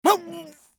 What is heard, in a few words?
scav wound; eft